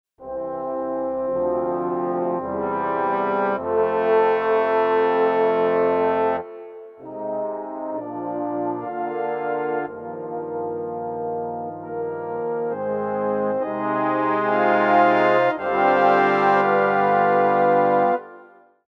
Orch Trombones; Montage; brass

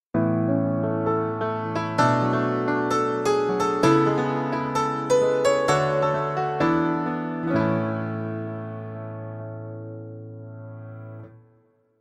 Rock and Pop Piano

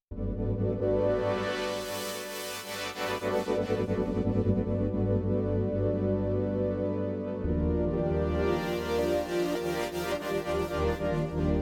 FM Sweeping Poly; Montage

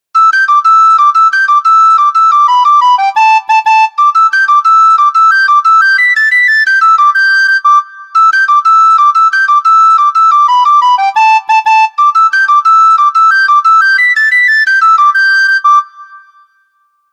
Irish Pipe Legato; montage; flutes

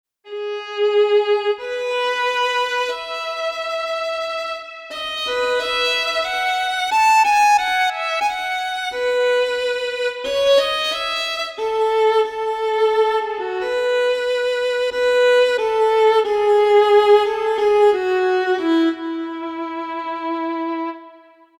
Solo 1st Violin; Montage; cordes

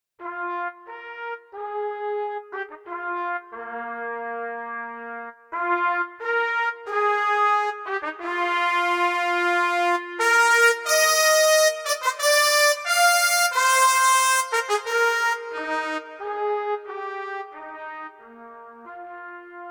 Orch Trumpets; Montage; brass

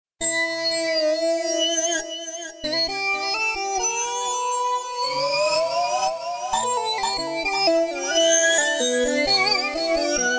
FM Sync

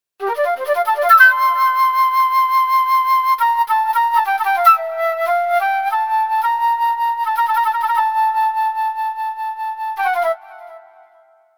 Sweet Flute; montage; flutes

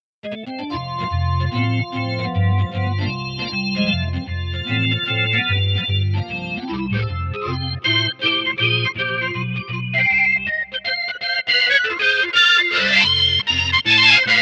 Multi Bras Organ; montage

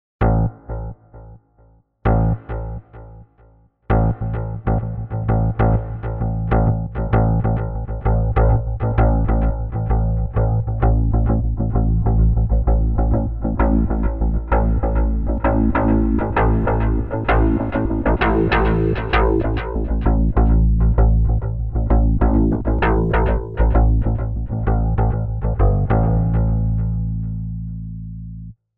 FM Prog Elec Bass; montage; basses

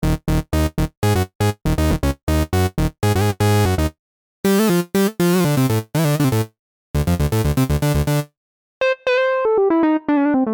Phat Vc XS Dry Ld-Ba; Phat Analog

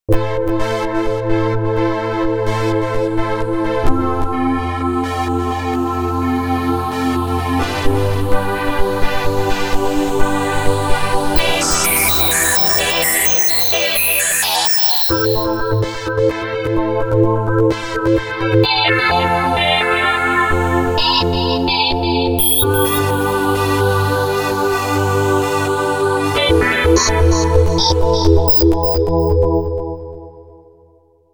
Waveseq FM; Montage

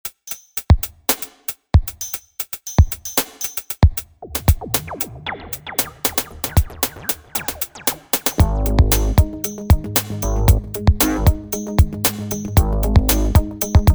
pure fm; Montage

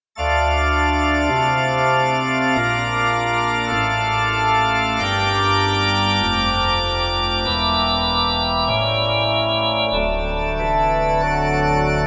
FM Church Pipes; Montage; organ

